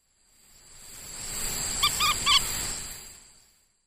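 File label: ruddy-breasted crake, ishigaki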